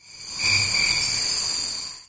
nature exit windows; windows 9x; sound schemes

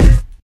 physics cube

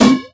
phys bouncy cube

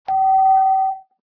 floor, ambient tones; Source Engine; cstrike

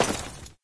dirt, player; Source Engine; dod

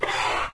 mystery question, windows 9x sound schemes

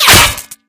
bullet impact bot

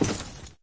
wood, player; Source Engine; dod

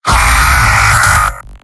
soldier pain severe; mvm

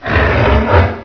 ag attack; agrunt; video games; source engine; bshift

